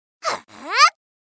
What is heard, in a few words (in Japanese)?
「はぁあ！」; スキル（オーバークロッキング）